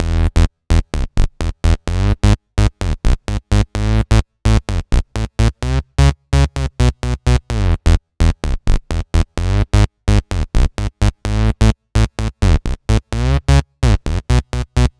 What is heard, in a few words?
bass, Key F#, Bpm 128, Big Room House; Loop Nerds; Big Room House Allstarz